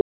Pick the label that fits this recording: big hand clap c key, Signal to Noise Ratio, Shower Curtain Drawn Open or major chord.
Signal to Noise Ratio